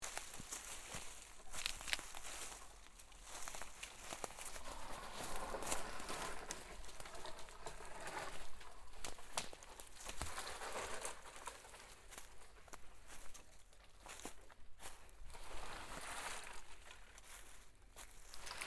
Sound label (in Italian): Far legna